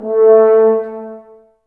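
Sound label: Brass French Horns A; Sample CDs; Miroslav Vitous; Woodwind Brass Ensemble; F.Horns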